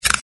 Player Camera; Bunnicula - Crop Control

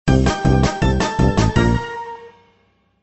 win music; Looney Tunes - Avalanche Snowboarding

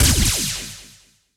star award; Ben 10 - Hero Time Game; ThrillGames

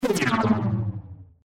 shrink; Cartoon Network - Toon Cup 2020

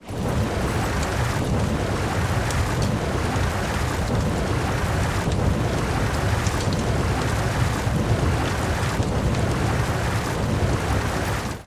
fire water; Ben 10 - Heatblast Fight

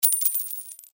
Coins Dropped; The Adventures of Puss In Boots - Catch The Thief; NextlevelGames

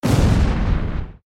fire attack; flameking; Adventure Time - Elemental; ThrillGames

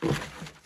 wardrobe attach outfit generic; run-dorothy

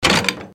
locked; Keep Out! A Dungeon Adventure for the Brave

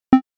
button; Mr. Bean - Backyard Junk

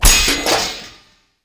armour lost; Gumball - Go Long!

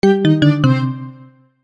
Fail; Bunnicula - Crop Control

